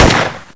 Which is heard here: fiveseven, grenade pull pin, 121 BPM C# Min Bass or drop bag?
fiveseven